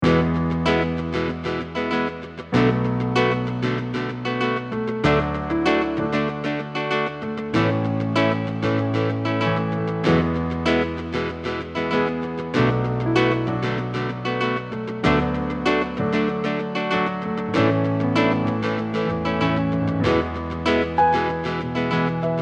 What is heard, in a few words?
Considering Range